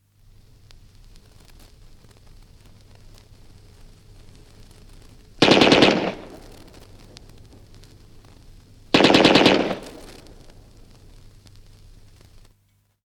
Two short rapid bursts of a Bren gun (from 78 disc); Machine Gun; BBC